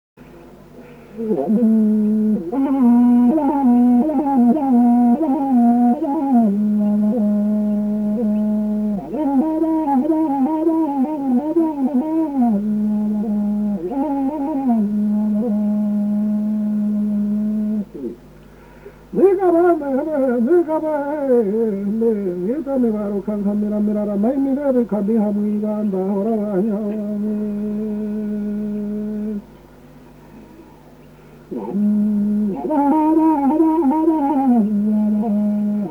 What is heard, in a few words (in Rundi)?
inzamba, burundi